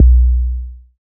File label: BD, Synth; Sound & Vision - Gigapack II CD 2